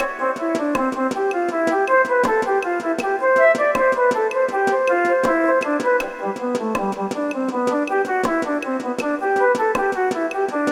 Quirky Villagers, fantasy

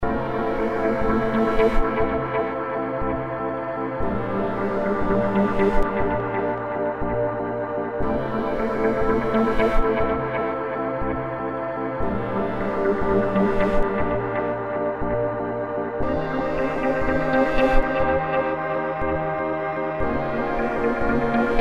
Sci Fi Trance

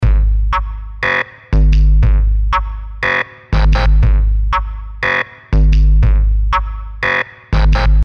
Weird Lab Loop

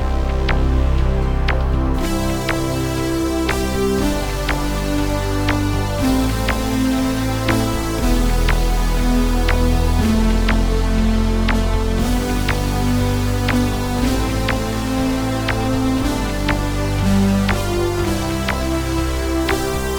Analog Hero, fantasy

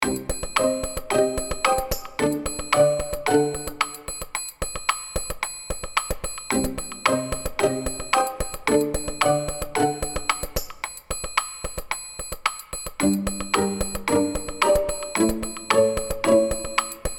Cat Burglars

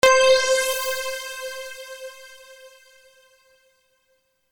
Power Up

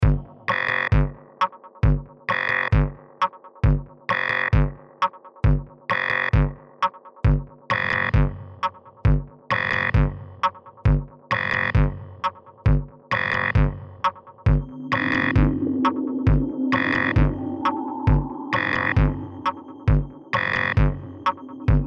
Creepy Lab Drone

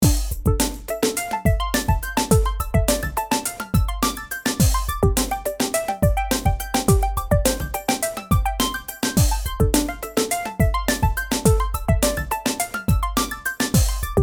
8 Bit Perplexion; Chiptunes Music

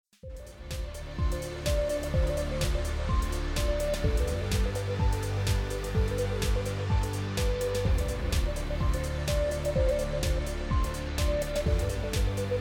Retro 80s Technology

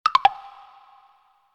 UI Quirky

